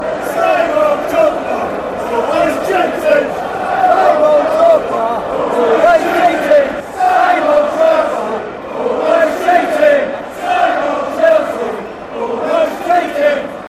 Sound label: same old, chants; drogba